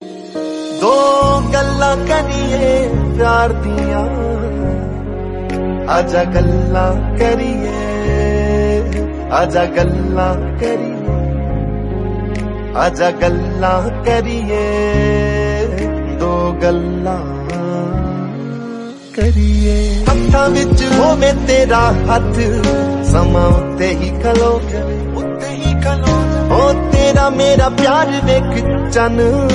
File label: Punjabi